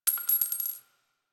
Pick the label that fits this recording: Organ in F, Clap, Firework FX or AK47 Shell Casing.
AK47 Shell Casing